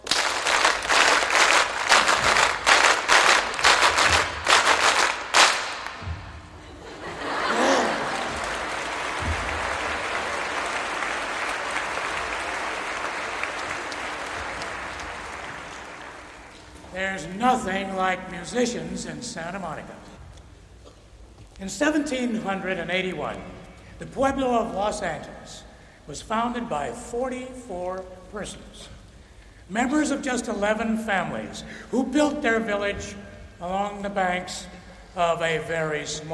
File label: Morning Hymn